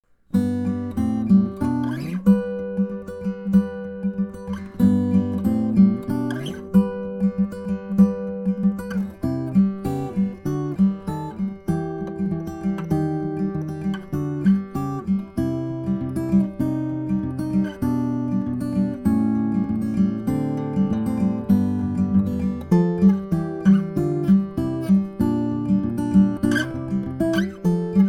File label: finger, guitars